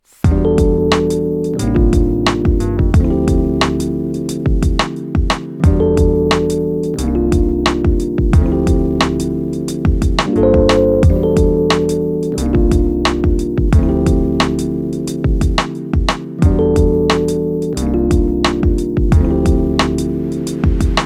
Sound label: Chords and Bass; Mimic chords and Monotone bass patches